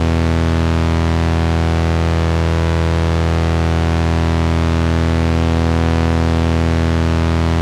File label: FILTER